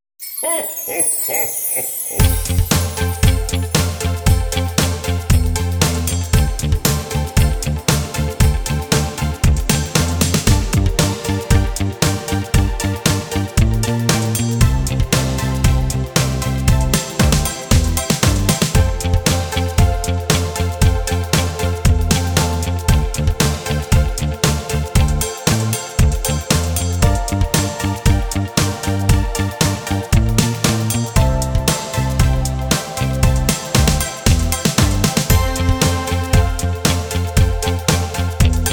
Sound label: karaoke